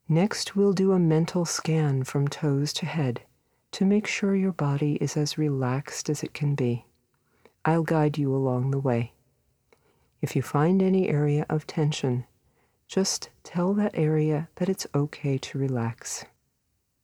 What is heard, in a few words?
Deep Relaxation Audio